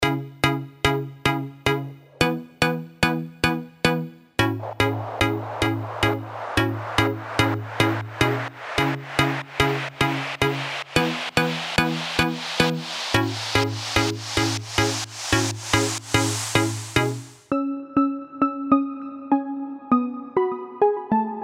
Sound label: Piano Synth Mix